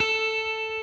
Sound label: Guitar & Bass; Quantum Leap